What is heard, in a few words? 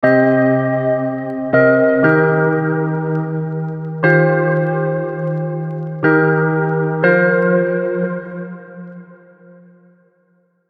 Minor Pentatonic Prog; Unison Minor Pentatonic Advanced Scales MIDI Collection